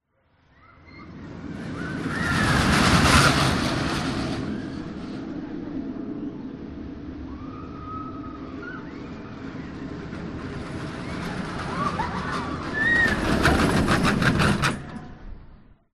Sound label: leisure theme park roller coaster pass by x2; jet rescue; seaworld australia